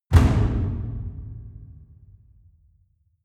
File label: drum hit hollow small